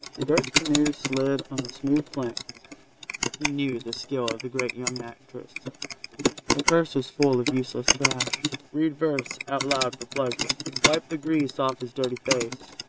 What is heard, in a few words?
keyboard